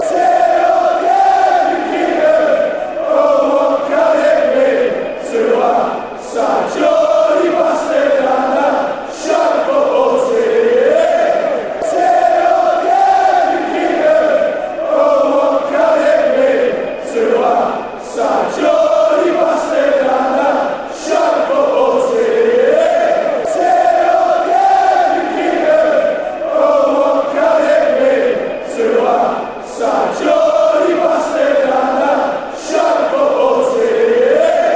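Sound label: cheer, football chants; kevin keegan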